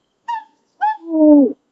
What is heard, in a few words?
mute swan noises